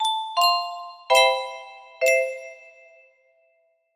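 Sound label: music box melody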